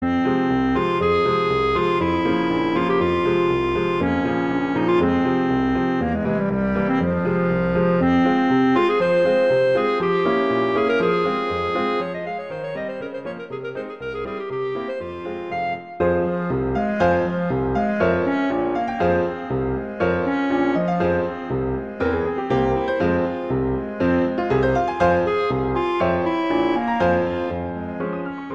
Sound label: Allegro; Brahms; Hungarian